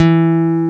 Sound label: PICK E2 AG, GUITAR; AKAI S-Series CD-ROM Sound Library VOL-8